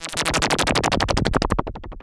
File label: beam